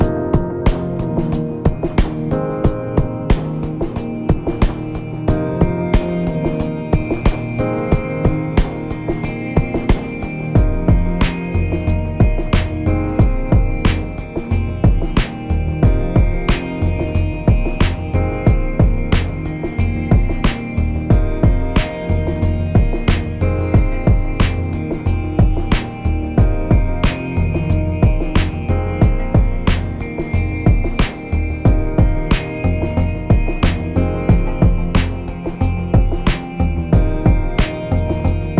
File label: BGM field red